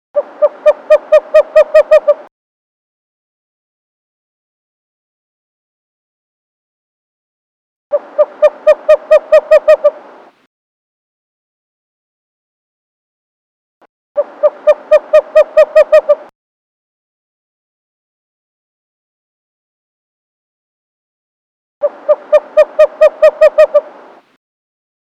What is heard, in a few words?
Western Screech Owl